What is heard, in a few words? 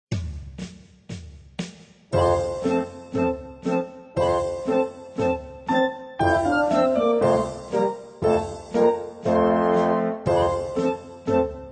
Turkish March